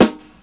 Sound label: 70s jazz funk snare drum sound G sharp key, Royality free snare sample tuned to the G# note; Loudest frequency: 1529Hz